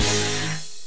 Power Synth Hit

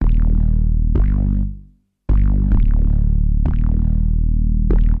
HipHop Bass

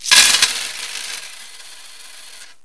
Pennies Coins

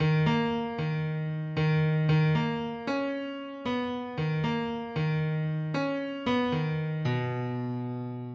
Grand Piano Dramatic

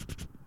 Pencil Eraser Sound

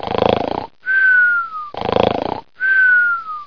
Person Snoring

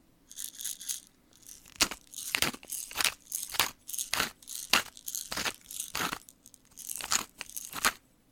Pepper Grinder

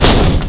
Fall Down Sound

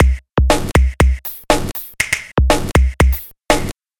Hip Hop Drum Machine

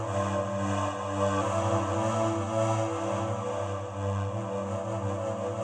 Gothic Synth Pad 85

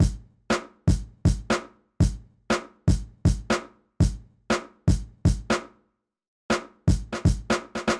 NY Hip Hop Beat

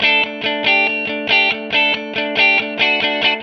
Pop Guitar

